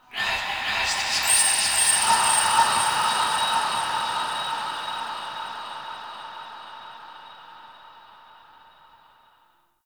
Spirit Tree Holy Chatters; Ambience; One Offs; Glades Of Andurin

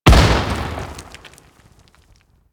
Close Step, enemies steps; Teralyst; Warframe; Eidolon

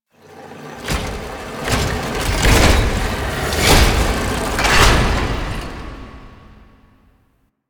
train time machine mech; ambience; Warframe; Entrati

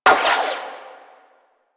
Shot 2D Fire, Weapons Hand Shotgun; Prime Pyrana

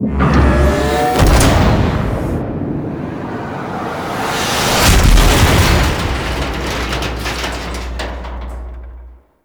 Pod Charge Fire Impact; Missions

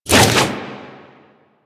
Flip Whoosh C, Foley; Enemies; Warframe; Teshin; DE

